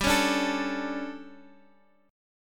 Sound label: D Major 7th Suspended 4th G